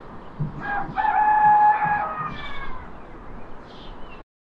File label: distant cockerel